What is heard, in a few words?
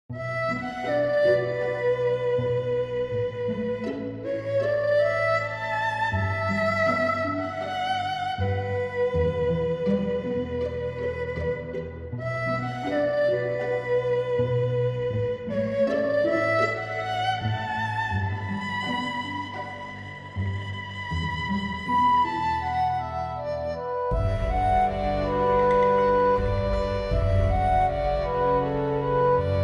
oriental fantasy emotional music; Patron Character Theme